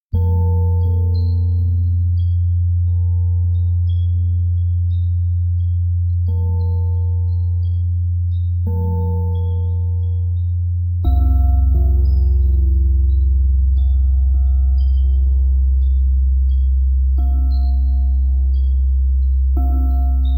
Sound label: Soft Bowl Chamber